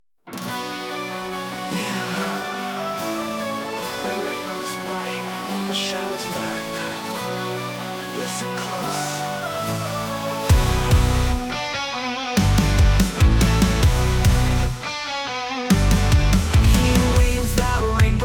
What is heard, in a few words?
metal ballad